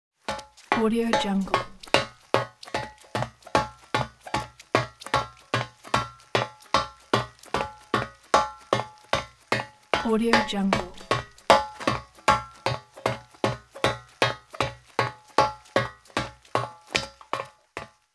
female footsteps on metal

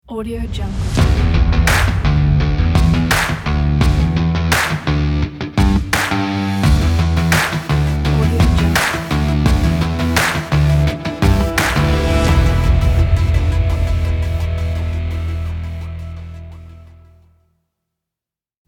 epic rock sport logo

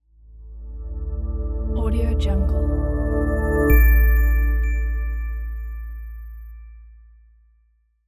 soft impact logo reveal

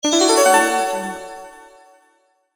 achievement

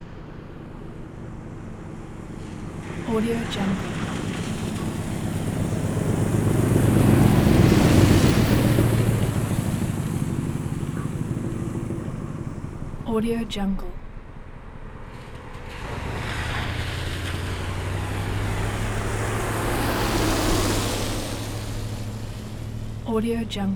auto through puddle in and stop turn off; prosoundfx

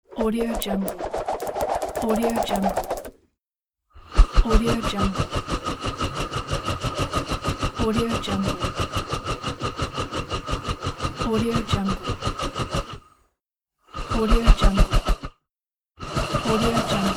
untwist skipping rope; kaelv

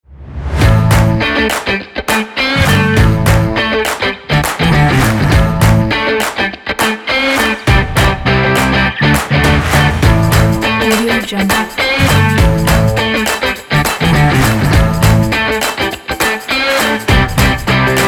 indie rock

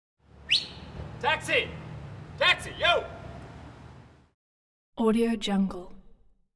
man whistles and yells for taxi

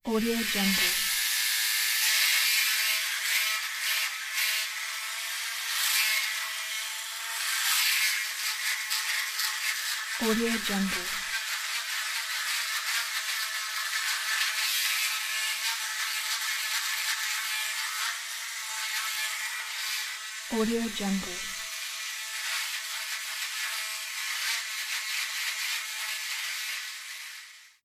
shaver

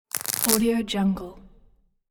electricity

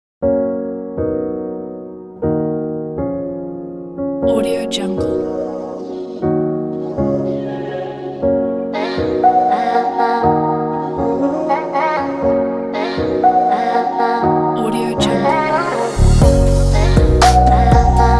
digital inspiration; music2noise

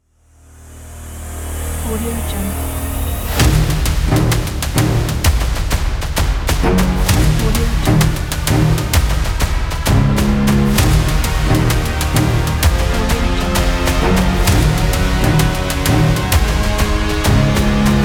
action trailer logo

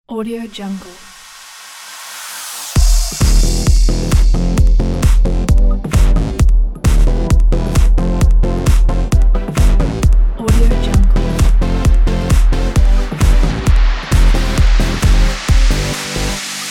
swedish house; lumenmedia

